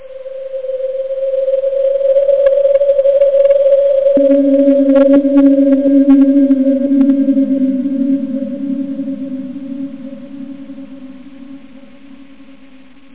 50s sci-fi

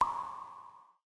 Maze Cave; Pirates Of Treasure Island

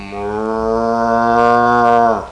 cow; Little Red Riding Hood; Koch; Storybook

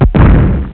bomb; PolyMine 1.06

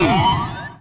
weird; Pong

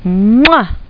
big kiss

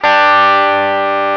Guitar; Sound Library 2000; Wayzata Technology

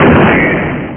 Monster; bd2demo; 5dsoftware

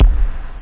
drums bass; OctaMED SoundStudio V1

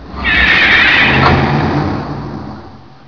DRIVE; Talking E-Mail

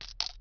cant buy; vampire demo

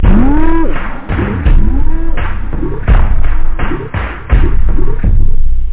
hoop; da capo vol1

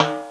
PAN

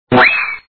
present out

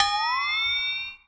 print complete

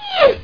grunt; coolspot

